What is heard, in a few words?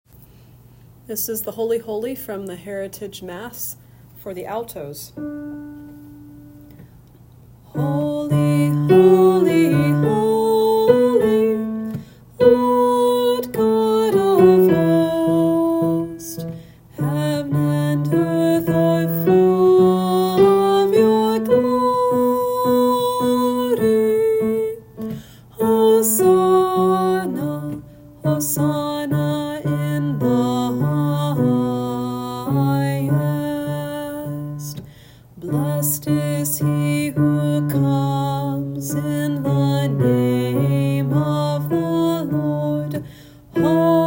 alto; Holy heritage mass